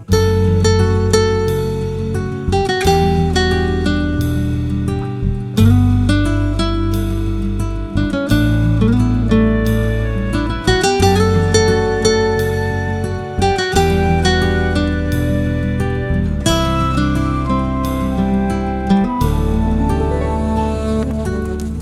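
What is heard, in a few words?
Guitar Music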